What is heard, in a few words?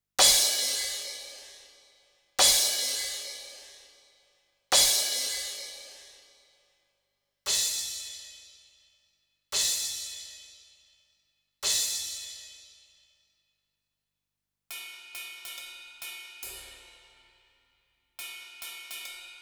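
Cymbal Test Eq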